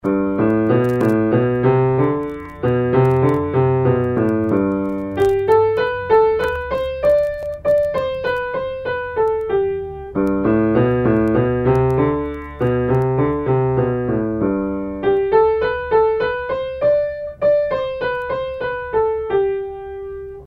Pianist; Level 1; Bastien

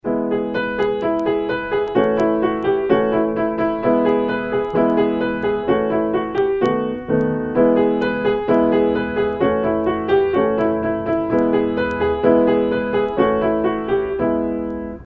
blues in e, Pianist; Bastien

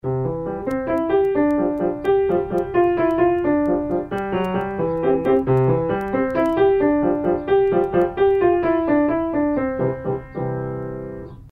waltzing elephant, Pianist; Level 1; Bastien

